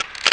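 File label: pickup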